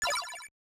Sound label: Small enemy destroyed; Gradius; MSX SCC